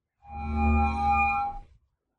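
kiberpank zvonok odinochnyi, cyberpunk single ring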